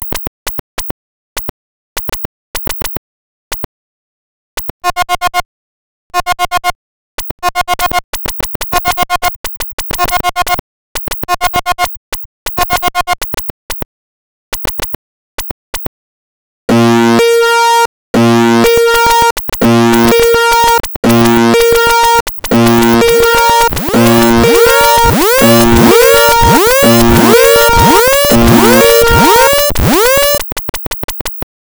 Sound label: Simulation